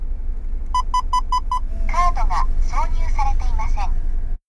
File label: car